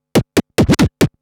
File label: Scratch Fills 140BPM; Vengeance - Essential Clubsounds Vol.4